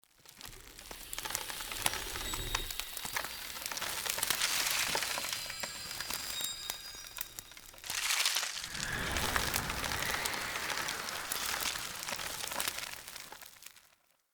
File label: Acorn Plant Grow; Cinematics; Bromius; Bromius Quest Acorn